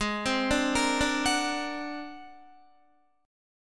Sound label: Ab 9th Flat 5th Ab down Guitar; Ab9b5; arpeggiated; JGuitar